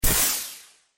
neo arcade movement

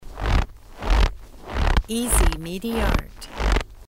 christmas snow steps loop

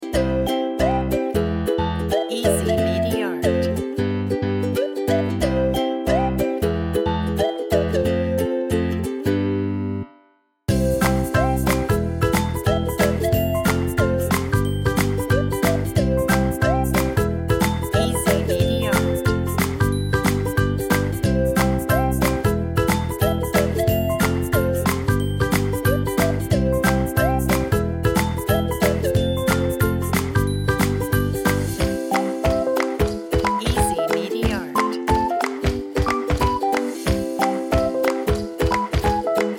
childrens happy version

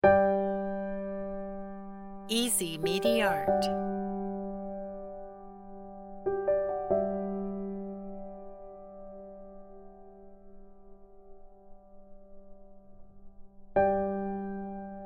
cinematic inspiring piano

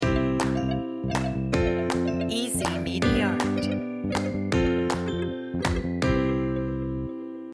happy logo

